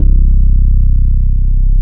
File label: MODUL BS C2, MODULAR, Synth